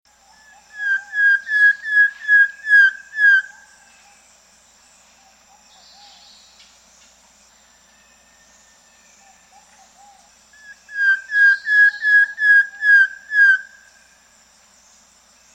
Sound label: Spectacled Antpitta, S. America